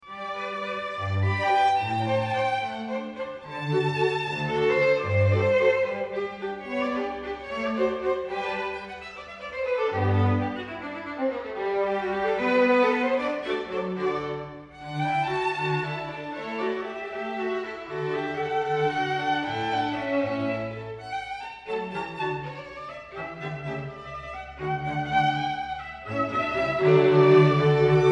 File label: Mozart Quartet No2 in G major excerpt